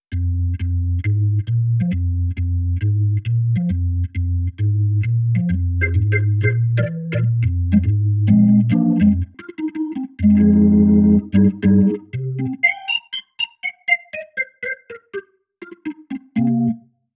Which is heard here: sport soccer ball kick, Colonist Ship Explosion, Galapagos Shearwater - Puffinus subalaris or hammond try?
hammond try